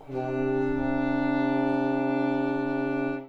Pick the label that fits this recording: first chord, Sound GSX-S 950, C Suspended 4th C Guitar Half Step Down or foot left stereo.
first chord